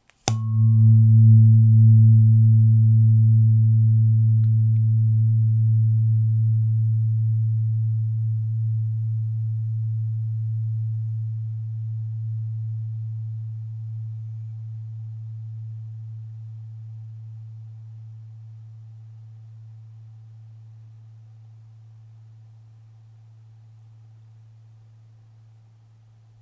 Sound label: 111Hz aluminium, tuning fork; sacrednotes